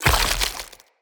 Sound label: Corrupted Slug Thorn Shot; Creatures; Abilities